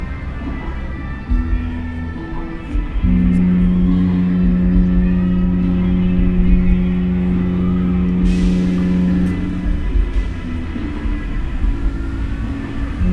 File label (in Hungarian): hajókürt kikötőből távolodó óceánjáró zene